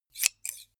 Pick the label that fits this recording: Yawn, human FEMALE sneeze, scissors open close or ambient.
scissors open close